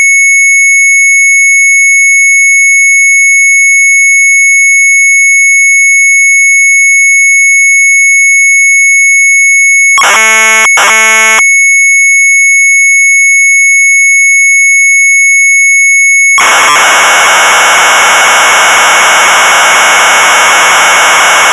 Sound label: Sharp MZ800 Tape; MIJNENVELD; retromelsarchive